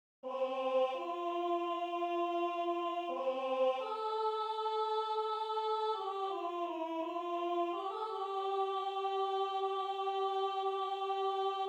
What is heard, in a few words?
Soprano